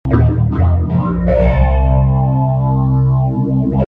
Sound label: Bass Amb D,C 126